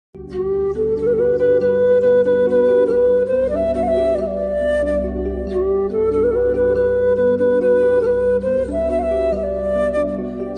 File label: Instrumental Song Ringtone